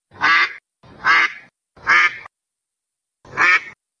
duck quack sound effect

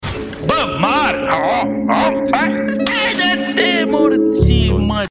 rage

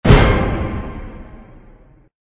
hit sound effect

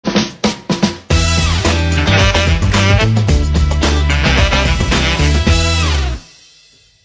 podcast bumper

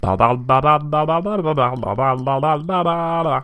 lablabla, muziekdoos

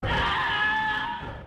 death scream; kings field

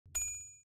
coin; limbus

